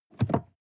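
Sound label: sports car interior seat handling medium; verkehr transport; autos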